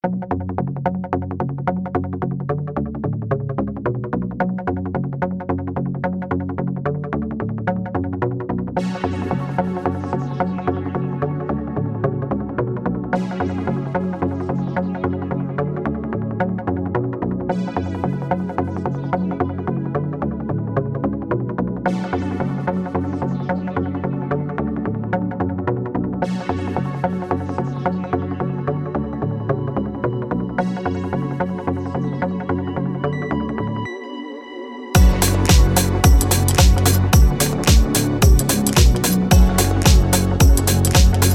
electro synth

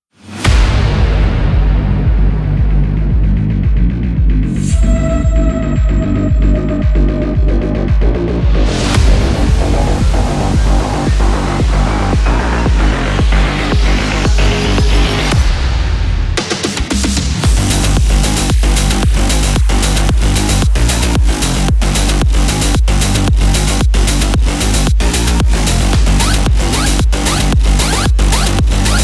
nitro acid hard electro

